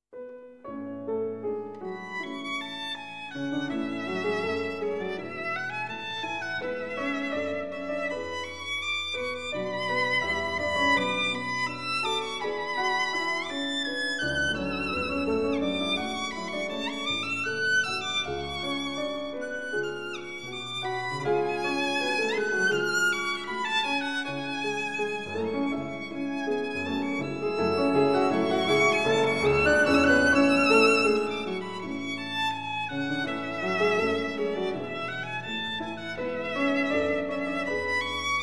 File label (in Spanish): Violin sonata; de Franck; canon